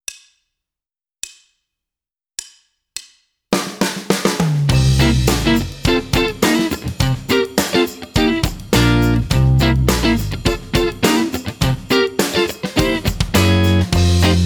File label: Rhythm Guitar